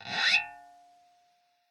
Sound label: scrape, glowly bass